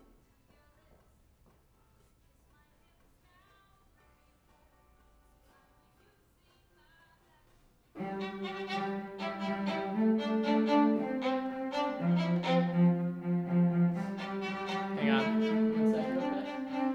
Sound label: Violin